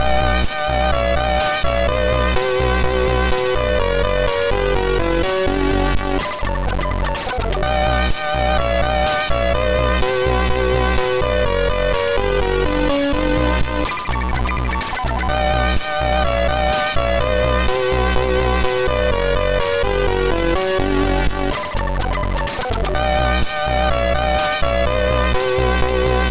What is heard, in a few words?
sid MOS6581R2; Zynon Zak